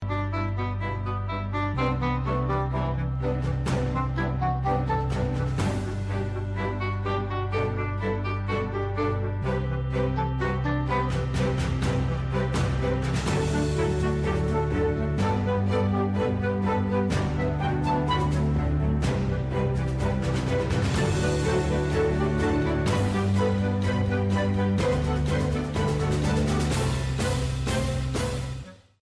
apple tv ringtone; apple tv theidoctor

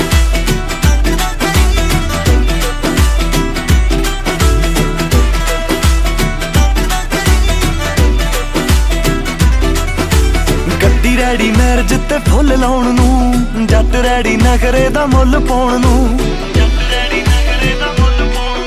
bollywood remix music ringtone

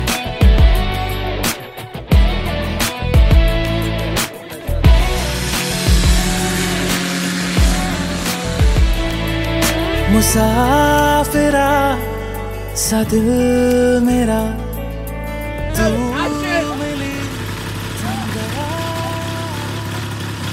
hindi ringtone

